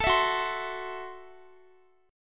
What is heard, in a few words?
Gb Minor 7th Flat 5th, Guitar; strummed